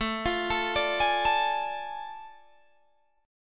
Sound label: A Major 7th Suspended 4th Sharp 5th, Guitar, Open G